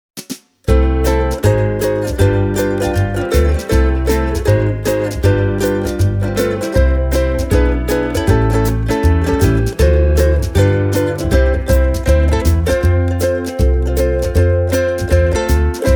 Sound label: Ortega RU 10